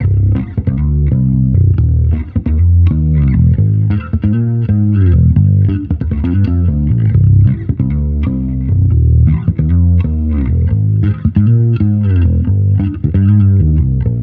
Amped loop 135 Cm, Electric Bass Loops; Slate Digital BASSHOLE Sample Pack

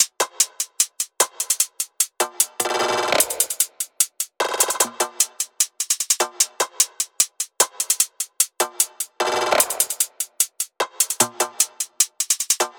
tops 150, Drum Sample Pack Loops Top Loops; SD SEISMIC; Slate

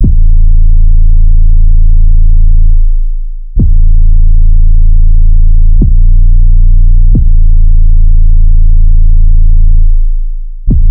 Synth loop 135 Am, Synth Bass Loops; Slate Digital BASSHOLE Sample Pack

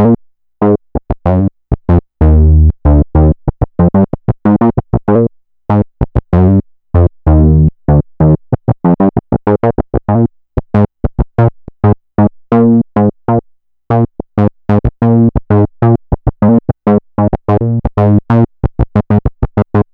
Synth loop 95 Bb, Synth Bass Loops; Slate Digital BASSHOLE Sample Pack

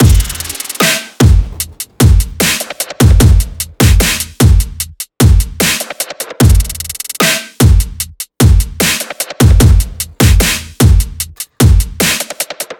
drums 150, Full Drum Loops; Slate; SEISMIC Drum Sample Pack

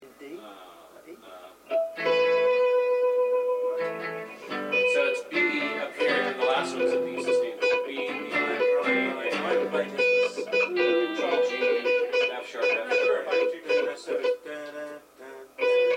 Background Chatter Mix

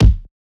kick one shot, drums; star; Slate; SD PASADENA Pop Sample Pack

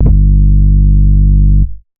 808 one shot G; Slate Digital BASSHOLE Sample Pack

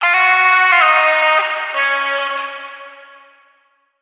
phrase F#, Vocal; Slate Digital Omnivox Vocal Sample Pack

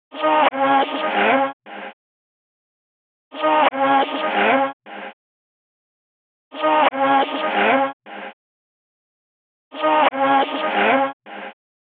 vocal chop, 150, C#; Say Something; Slate Digital Omnivox Vocal Sample Pack; Chops

